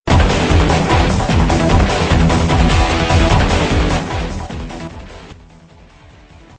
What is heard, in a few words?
guitar; dante